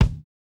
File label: kick, house